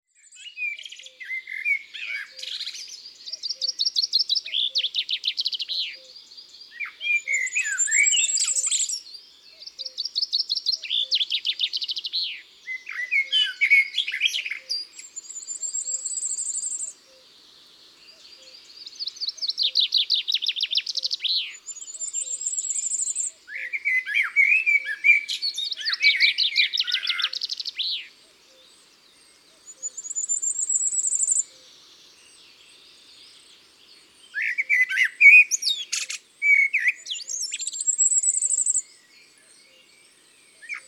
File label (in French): Bois et forêts